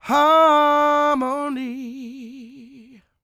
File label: CROON, Vocal Blues & Soul MELLOW